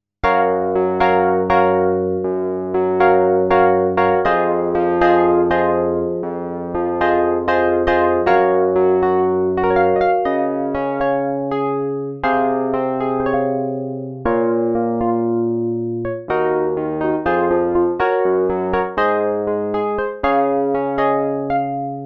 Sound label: Wurli